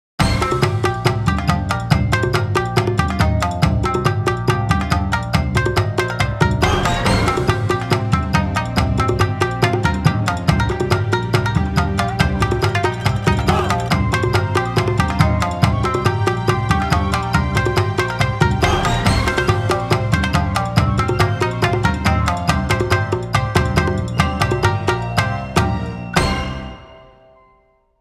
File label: Demo Jingle; KG Oracle